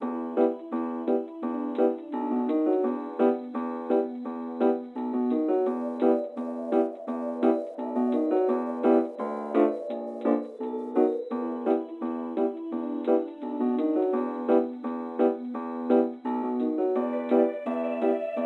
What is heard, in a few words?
music elevator; TyTheTasmanianTiger3; XBOX